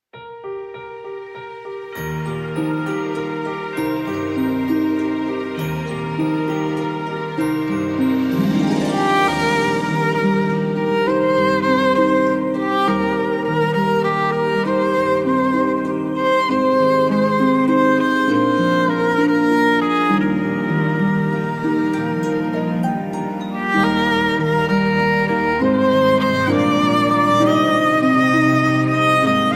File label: harp violin